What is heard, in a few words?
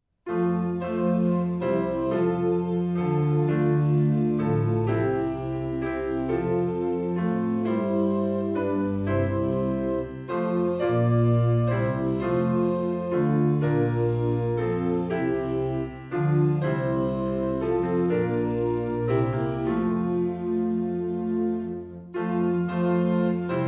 SDA Hymnal Song Tune